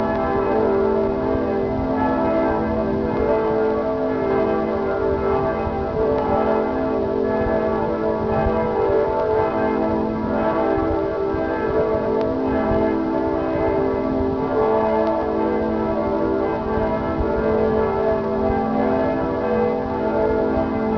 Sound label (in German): Glocken, Regensburg, Dom St Peter